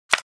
Playing cards flipping a single card from a deck poker blackjack